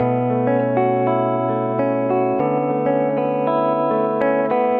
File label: guitar, Jazzmatazz Loops